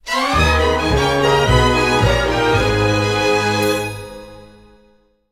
jingle release; Sonic Lost World

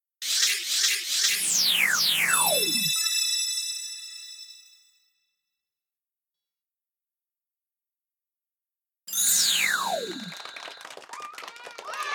backing track; WWE 2K22; Firefly Funhouse

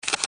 SFX Journal Switch Page; Alice Madness Returns

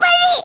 Damage Fly; JPurin

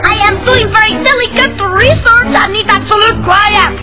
Absolute Quiet; CN Soundboard

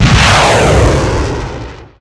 Nuke Fire; DNF

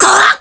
Damage Fly; Poke Zenigame

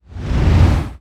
Catch Swing; Bayonetta2

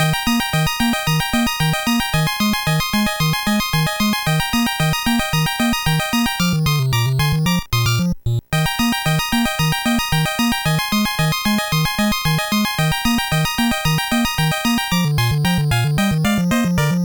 BGM; Bombjack; Xbox

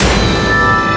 Results; Bayonetta 3

